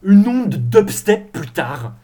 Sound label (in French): Une onde dubstep